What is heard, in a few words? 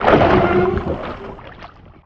Fish Monster Death Roar; Mars Sortie